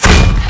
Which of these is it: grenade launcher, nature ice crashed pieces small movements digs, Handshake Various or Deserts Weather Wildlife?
grenade launcher